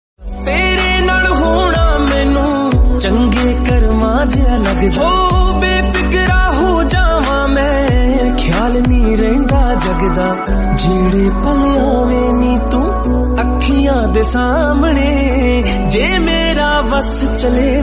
new punjabi romantic song

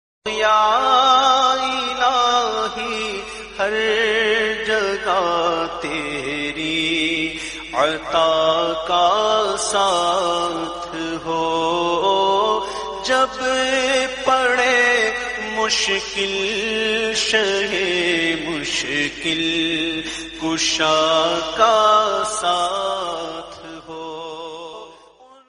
naat islamic devotional

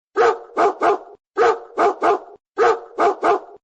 dog sound notification

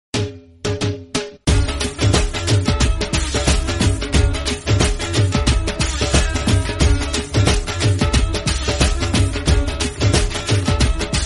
bhangra

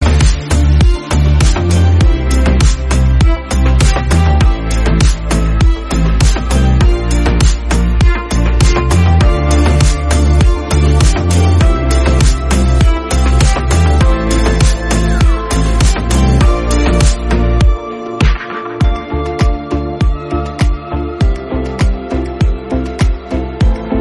bgm

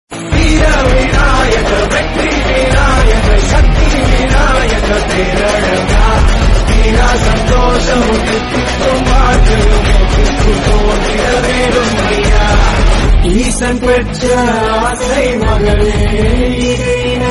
tamil devotional; vinayakar

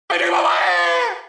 warcry; raiden

